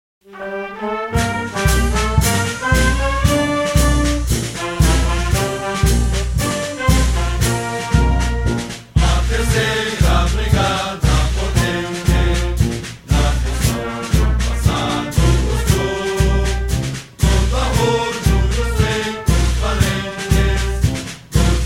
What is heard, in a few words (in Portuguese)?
Canção; Canção da 3ª Bda C Mec